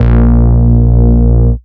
Root A#, Ultimate Bass; Vengeance Samples

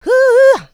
vocal house adlibs; PS-28 Vocal House Samples

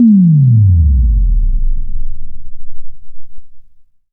Downlifter

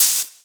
Open Hihats, Cymbals; Vengeance Samples

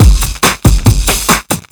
Breakbeat; Vengeance Samples; VEC2 Loops

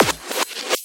Loops Fills; Vengeance Samples

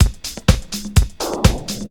drum loop 125, vocal house; PS-28 Vocal House Samples; drumloops; houseworx

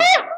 Vocal Oneshots; Vengeance Samples; Vocals

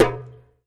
Bongo; Vengeance Samples; VEH1 Percussion

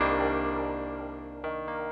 piano 125 G, piano loops, vocal house; PS-28 Vocal House Samples; instrumentloops

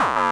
BD Distortion, Bassdrums; Vengeance Samples

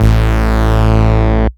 Bass Root A

